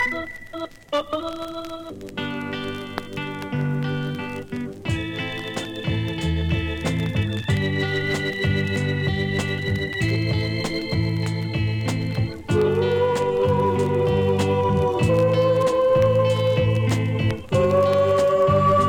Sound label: Jamaica Northern Soul; GIANT